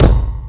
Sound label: Thud KIK, Dirty Hip Hop Kit; drums full kits; style kits